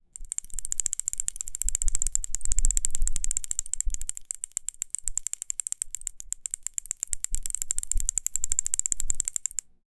small amounts of fluid sp, liquids

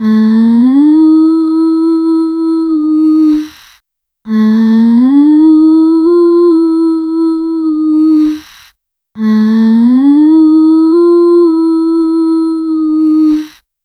soft anime girl moan sens, breathy

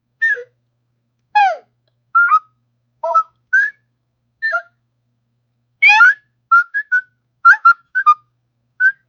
a little playful robot ss, cute

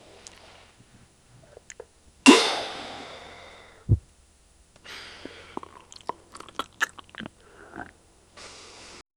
man taking bottle opening, drinking

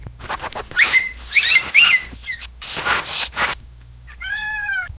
confident string grab

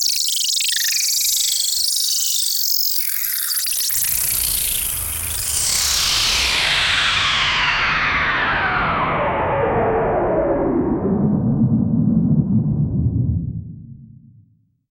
titititii, musical

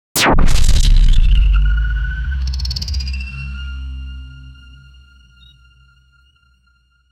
quick sci fi energy burst

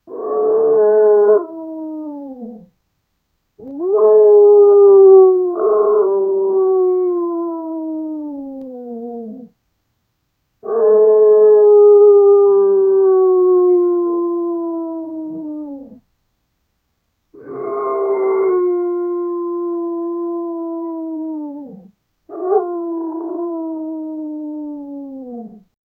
smallest dog howling; animal vocalization

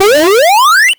1 up sound like mario

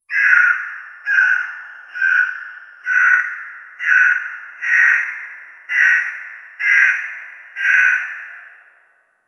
a nightmarish creature a, avian

